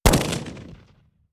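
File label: 12gauge exterior shot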